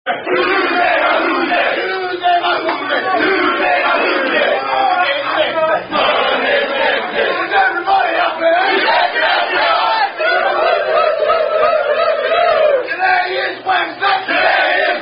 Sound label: Rugby Songs